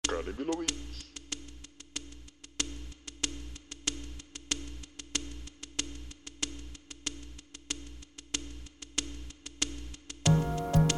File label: beat; sello de agua